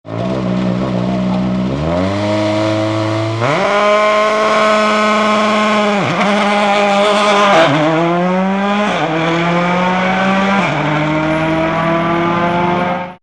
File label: Peugeot 206 WRC; monza 2007